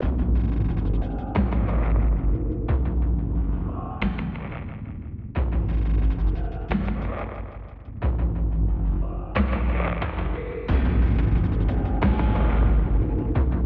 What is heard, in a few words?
drums; World of Tanks Blitz; hangar loginscreen